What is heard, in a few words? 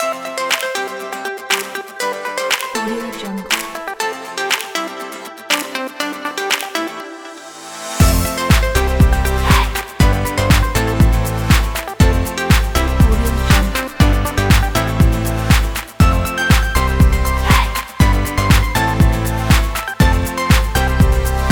confident and upbeat high spirits; doriansounds